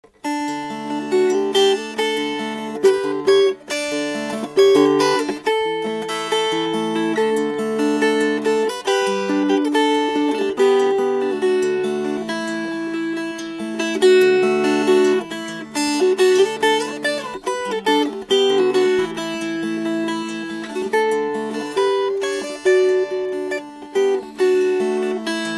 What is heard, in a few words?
Fingerpicked